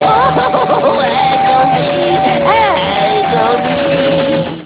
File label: agony